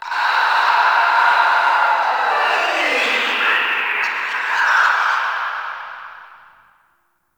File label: Exterior Foreground Infested D; Warframe; Ambience; NineteenNinetyNine